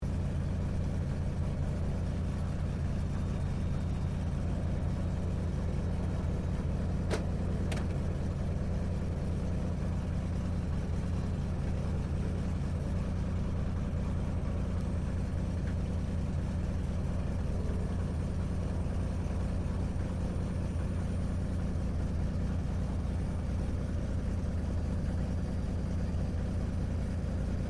AIRCRAFT CT4A IDLE INTERIOR, military trainer aircraft; Airplanes; Vehicles